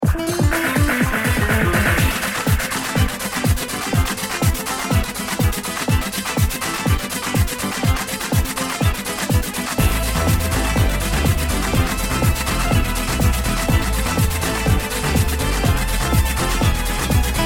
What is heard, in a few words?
bgm zone field; zone4; goken